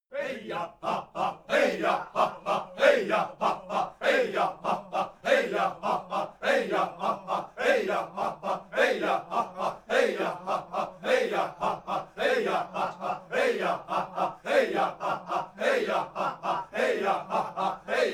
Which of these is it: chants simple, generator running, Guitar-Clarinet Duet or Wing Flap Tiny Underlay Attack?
chants simple